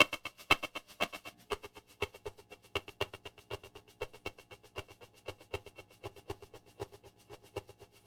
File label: Warped Shaker 119; M-Audio; Pro Sessions VOL-38; Abstract World Fusion 2